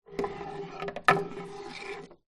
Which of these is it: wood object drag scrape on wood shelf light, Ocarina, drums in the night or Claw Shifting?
wood object drag scrape on wood shelf light